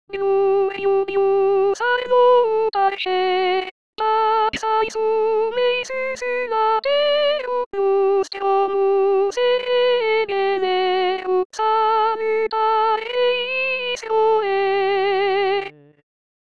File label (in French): Soprane